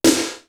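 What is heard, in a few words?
HOUSE SNARE; East West; Global Sounds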